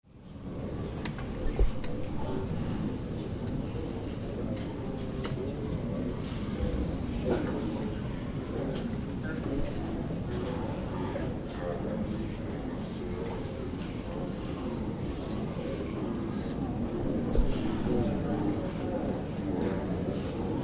Crowd airport baggage check in queue atmosphere

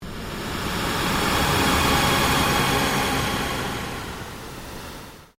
PULSE LONG SWEEP

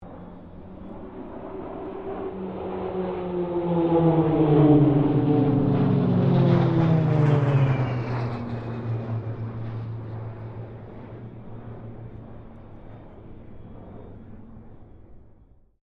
AIRCRAFT PC-9A SQUADRON 5 PASS, air display; Roulettes air display; Airplanes; Vehicles

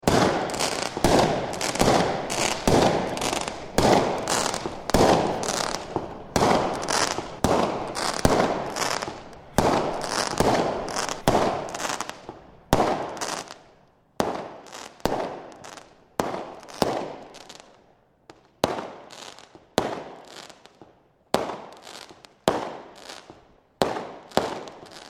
Fireworks Bangs, Banging and crackling fireworks; Fourth Of July

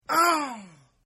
Grunt

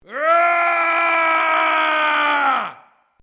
MALE ROAR AGGRESSIVE LONG; Yelling; Voice

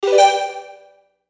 Musical Flute, Musical Flute Pad Ascend; Woodwinds; Blastwave; Sound Effects:Musical

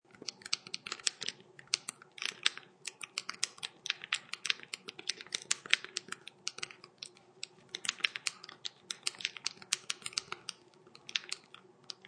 Texting, Texting sounds on cell phone.; Typing; Offices

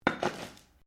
METAL ON GRAVEL IMPACT GENTLE; Sound Effects:Materials

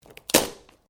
Branches Dead Snap

